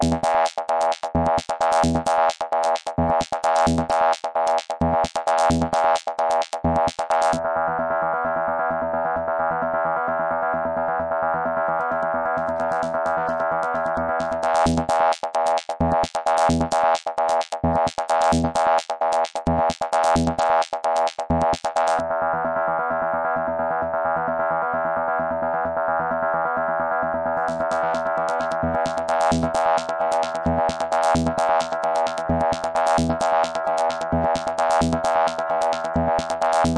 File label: sneaky trance explore, rollertechno